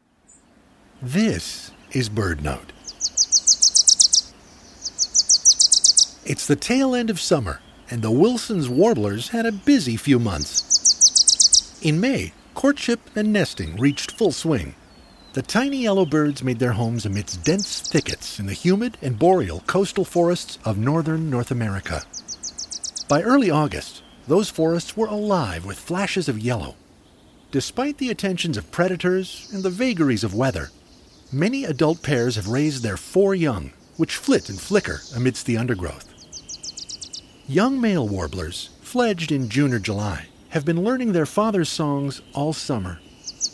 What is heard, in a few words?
wilsons warbler near summers end